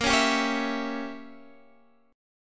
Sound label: Bb Diminished 7th Bb Guitar Half Step Down, chord